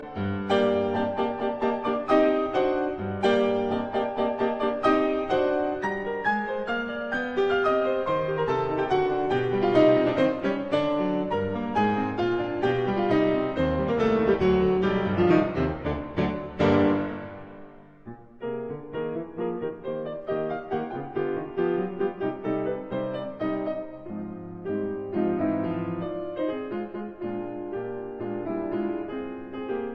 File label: Piano Sonata in G minor, Scherzo; Piano Sonata No 2; Op 22